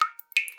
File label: Drips